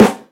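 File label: raw snare drum sample a key, tuned to the A note; snare-drum-samples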